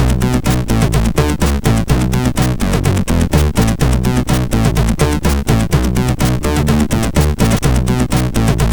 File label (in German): Bassist, MOS6581R4; sid